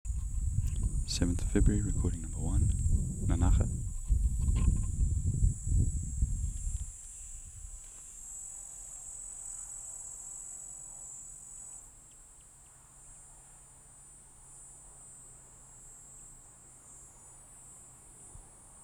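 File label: Platypleura plumosa Nanaga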